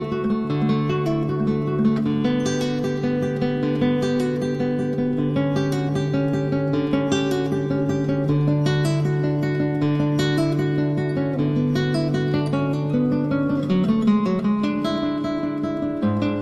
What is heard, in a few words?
Cello Suite No. 1- Prelude; Bach, Johann Sebastian; arr. Michael Lorimer for guit